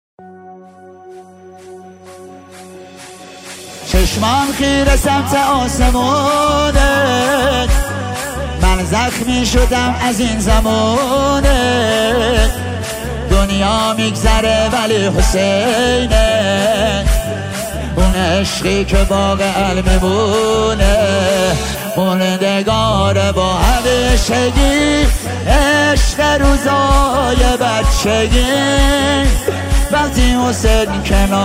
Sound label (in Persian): نوحه; muharram